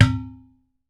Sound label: UDU SLAP, African Perc; E-MU Formula 4000 Series Vol. 4; Earth Tones